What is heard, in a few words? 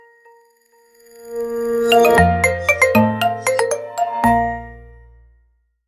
whatsapp nokia tune